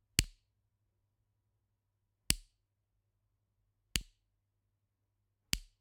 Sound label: Generic Button Wood Small